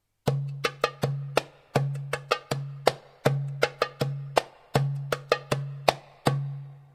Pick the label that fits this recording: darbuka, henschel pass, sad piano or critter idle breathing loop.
darbuka